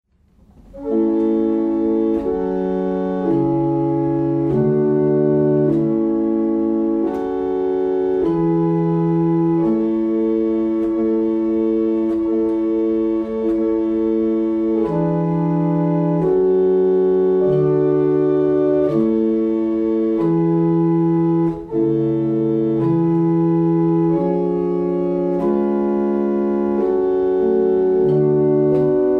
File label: barrel organ; Lillington